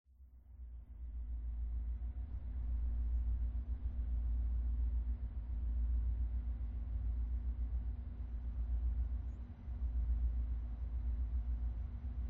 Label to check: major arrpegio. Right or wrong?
Wrong